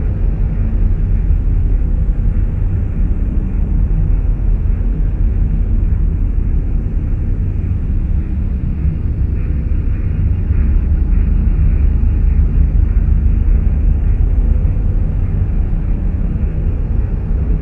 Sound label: nemesis juggernaut hum; Stellaris